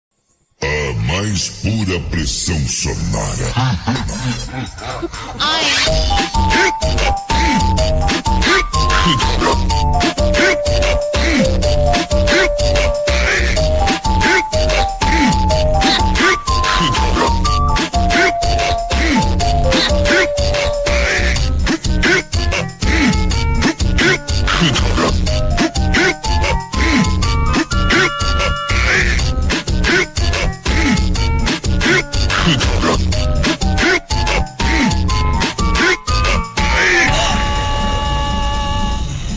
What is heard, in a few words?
funk remix; beat kof x legends of zelda